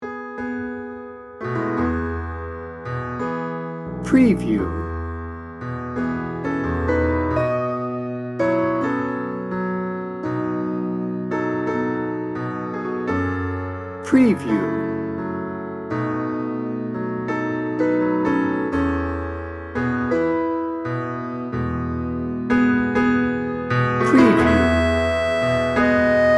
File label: sexy tango